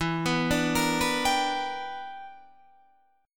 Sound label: E Minor 7th Sharp 11th E; Em7#11; arpeggiated